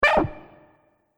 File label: Multimedia Button; Button Sound Effects